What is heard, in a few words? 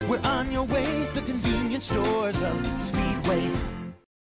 Speedway Jingle